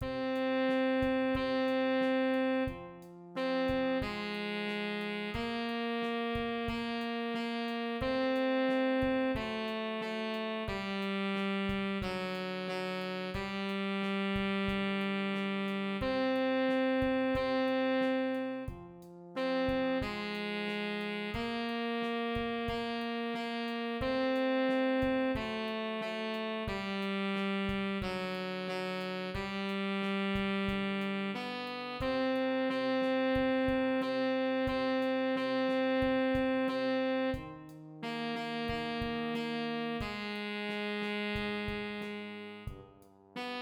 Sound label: horn sax